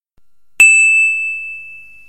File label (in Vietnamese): Nhac Chuong Tin Nhan iPhone Ting Ting